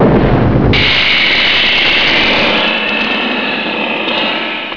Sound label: crash